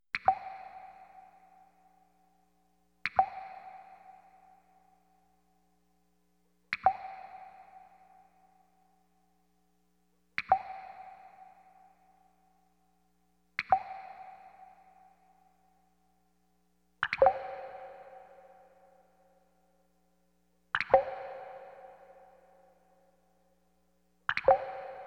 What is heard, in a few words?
Water Dripping; 1001 Sound Effects; Halloween Horror Sounds Effects